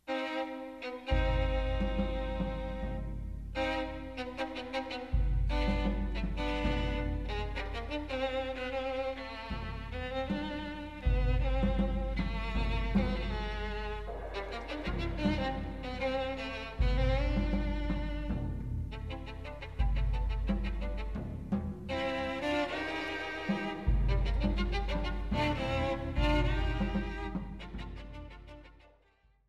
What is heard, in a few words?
Tango, Waltz, Ragtime; Histoire Du Soldat (Suite); Scene2 Trois Danses; ballets